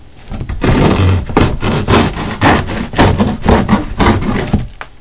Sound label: Hand Saw; How Many Bugs in a Box; juicebar